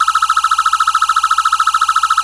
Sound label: phaser